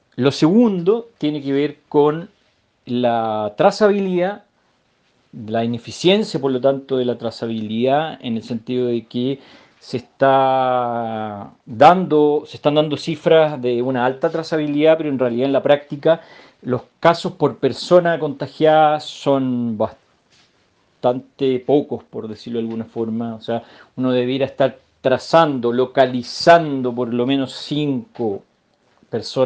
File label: BASS